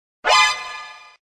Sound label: alert